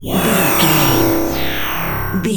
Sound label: sci-fi force field holo power down